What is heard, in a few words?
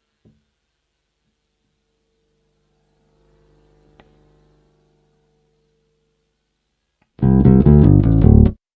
Bongo Hum Cancel